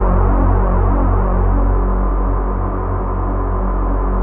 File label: MOVE BASS; Masterbits; Vintage Voltage